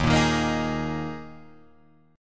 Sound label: Eb Suspended 4th Eb down Guitar Open F; Ebsus4 strummed; JGuitar